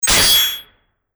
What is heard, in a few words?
swing; Post Game jam